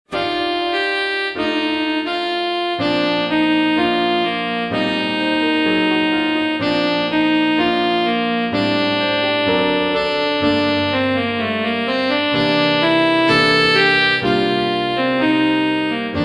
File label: Romance w Sax; Clarinet Solos